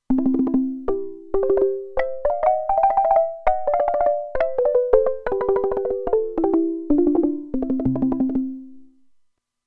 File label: Marimba, Roland Alpha Juno-2; Original Sound Chart patches